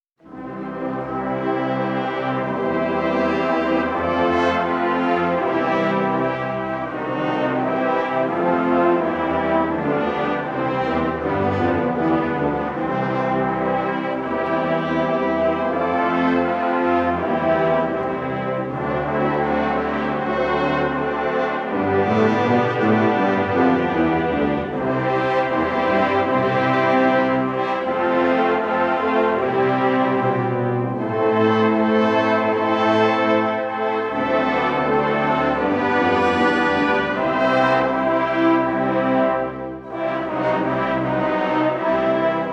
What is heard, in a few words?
MARCHING BAND; Alma Mater